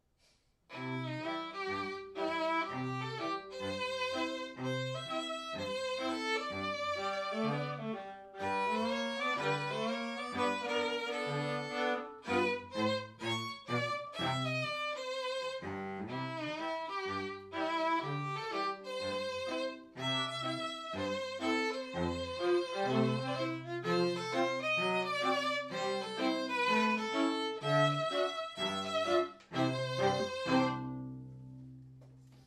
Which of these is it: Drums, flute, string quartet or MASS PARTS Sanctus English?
string quartet